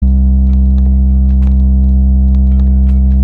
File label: feedback, turntable record player; how to ground turntable record player